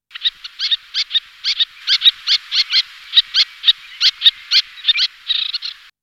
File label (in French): Sterne naine, sternula albifrons